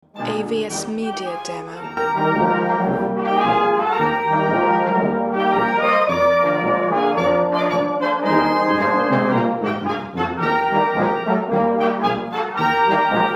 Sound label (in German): Blasmusik